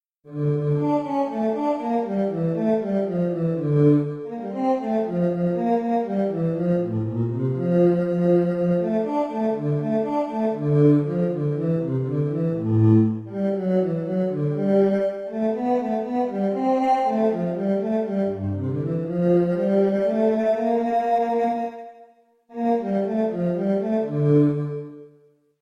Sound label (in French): basse